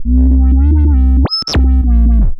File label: Roland B Line, Roland S100